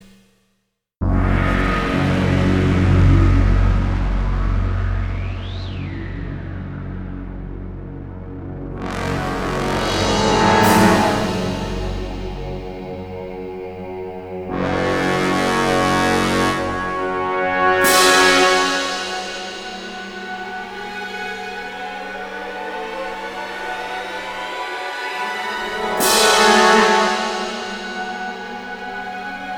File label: Battle F#m 125bpm; Disney Infinity 2.0 Marvel Super Heroes; Arachna